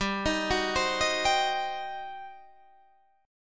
G Major 7th Suspended 4th Sharp 5th, G, down, Guitar, Standard; GM7sus4#5 arpeggiated